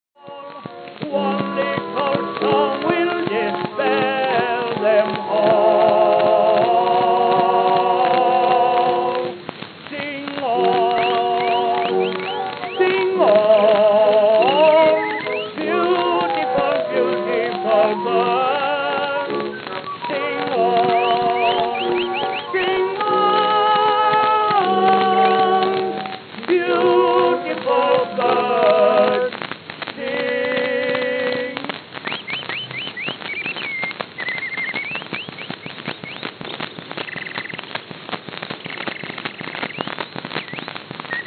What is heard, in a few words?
Optical Beautiful Birds